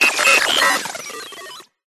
science fiction computer; Audio Mangler

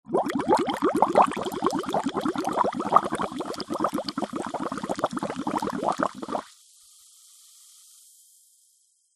food drink bubbles blow straw soda glass fizz

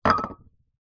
household aerosol can down on wooden table

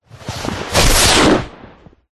sound design hit whoosh into a punch like impact; zapsplat

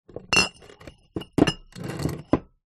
glass bottles x2 movement on dirty table

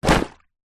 leisure lilo popped full of water place down

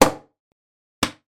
household shaving gel can lid off on; sound effects one

